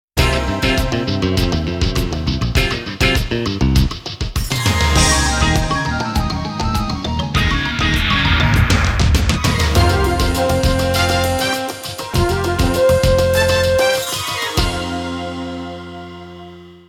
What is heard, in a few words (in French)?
Emission jingle; Jingles medley